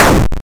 Explosion retro

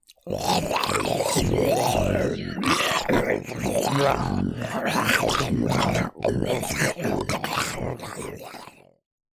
Zombies

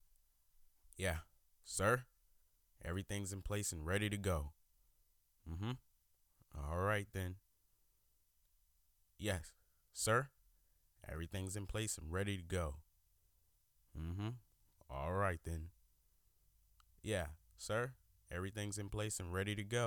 Men Sounds

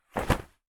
Jacket Cloth Rustle

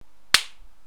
Blow

